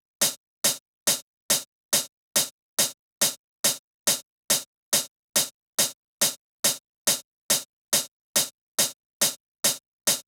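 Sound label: drum Loop 140BPM overhead; Vengeance - Essential Clubsounds Vol.5; VEC5 Drumloops; VEC5 Multi Loops 140BPM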